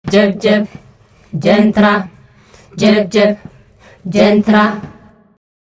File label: african choir